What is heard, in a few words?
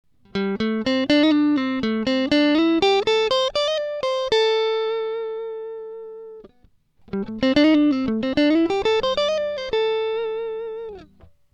slide